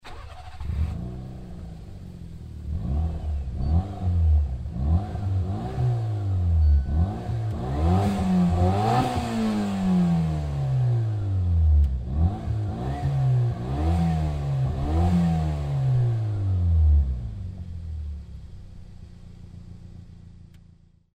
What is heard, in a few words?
copen sport muffler; car; dsport